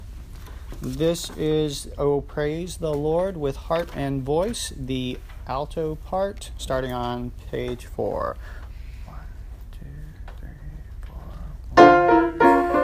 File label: O Praise The Lord Alto